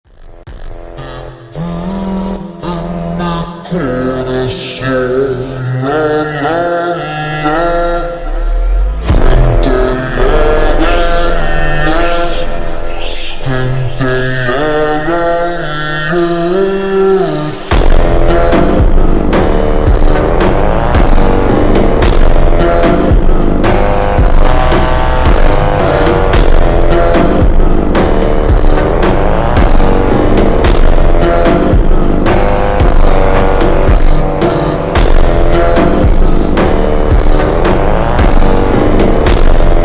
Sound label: SLOWED BRAZILIAN PHONK